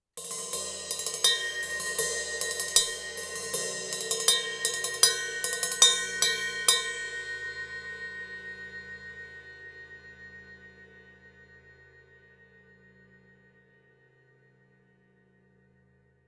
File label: Shure KSM11 Cymbal